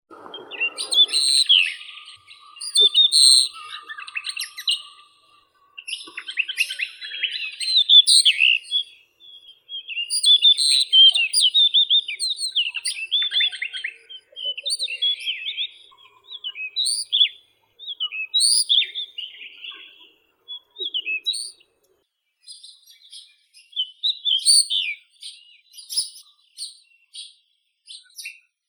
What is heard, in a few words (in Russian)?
chekan chernii saxicola caprata